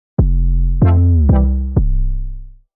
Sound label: Empowering